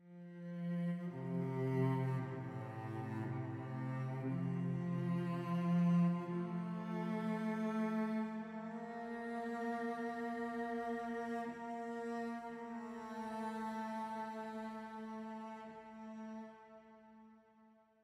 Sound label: Celli 58bpm 4-4